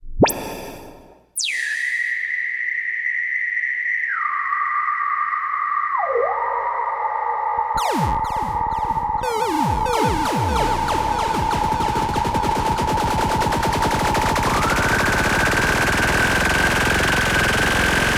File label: Drum and Noise; Muzik 4 Machines; Jams